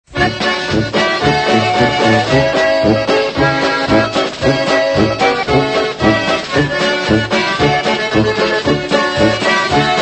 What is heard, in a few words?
polka